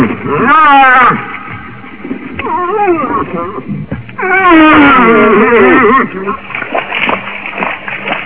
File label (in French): orignal femelle